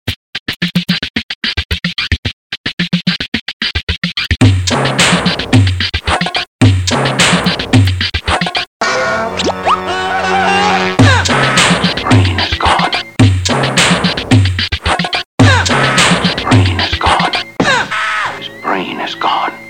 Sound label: thunderclock